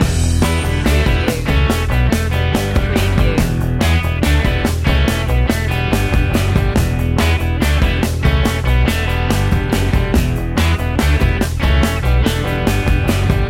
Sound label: hippie loop